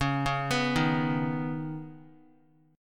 C sharp-7th Suspended 2nd-C sharp-x,4,1,4,3,x-8-down-Guitar; C#7sus2; arpeggiated; JGuitar